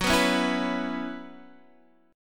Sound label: F sharp 7th Suspended 4th, Guitar, Open D